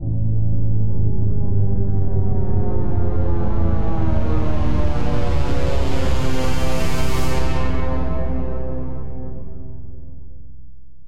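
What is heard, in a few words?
Dissonant Chord Swell A minor 130 bpm; MIDI Clips; Tonal; Atmospheric; Ableton; Factory Packs; Mood Reel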